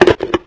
megalodon attack

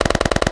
fur seal attack

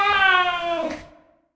chimera galensis die

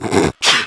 icebear stand

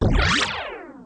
dark snake lord attack

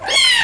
ghost cube damage

